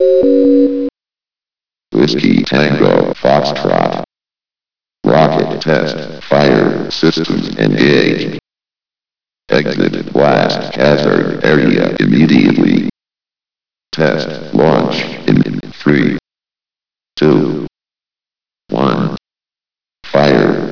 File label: rocket